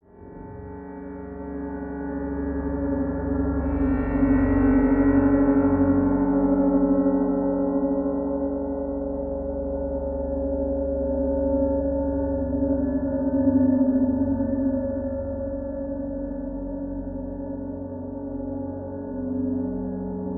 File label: Ambient One Long; No More Room In Hell; Windows